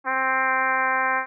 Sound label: trumpet